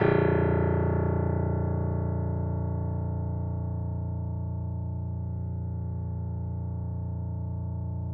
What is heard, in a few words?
piano; East West; The Ultimate Piano Collection